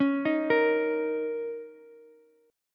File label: A sharp Minor Double Flat 5th, Ukulele; arpeggiated; x,13,11,13; JGuitar